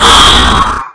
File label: pain; quake2; cobra